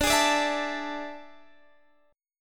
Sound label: A Major 7th Suspended 4th D